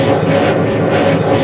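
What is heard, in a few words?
Engine Thrust Interior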